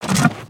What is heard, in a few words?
Basic Melee; creatures organic; Skullken; aronwk collection; ndaudio